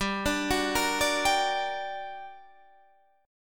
G Minor Major 7th, down, Guitar, Standard; GmM7 arpeggiated; JGuitar

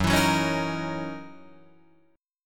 F sharp 13th F sharp Guitar Drop D

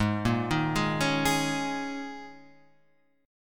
D sharp 7th Suspended 4th G sharp

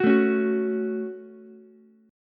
Db Suspended 4th Sharp 5th, Ukulele; 11,9,9,9; strummed

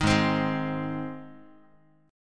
G sharp Major G sharp Guitar, chord; strummed; JGuitar

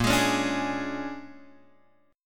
A sharp 7th Sharp 9th, Guitar, Open G

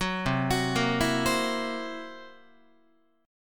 C 7th Flat 5th C down Guitar; C7b5 arpeggiated; JGuitar

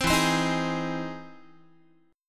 E Augmented Major 7th E down Guitar; E+M7 strummed; JGuitar

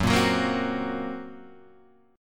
Gb Major Flat 5th, down, Guitar, Standard; strummed; JGuitar

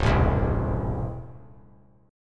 Db Minor Major 7th Sharp 5th Db down; DbmM7#5 strummed; JGuitar

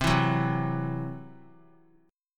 C-Major Flat 5th; strummed

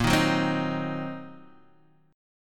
Gb 6th Flat 5th Bb, guitar

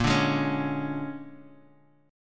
A sharp 7th Sharp 9th A sharp, Guitar Standard; strummed; 6,5,6,6,x,x; chord; JGuitar

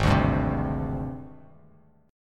G sharp Major 7th Flat 5th; strummed; chord

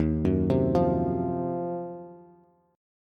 Eb Minor 6th Add 9th Eb down Bass; arpeggiated

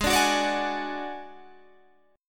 D Major Flat 5th A flat, chord

